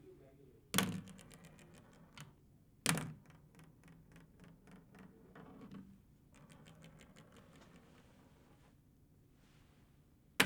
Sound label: Pens Rolling Across A Desk